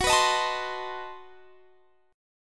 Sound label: B 7th Flat 9th G flat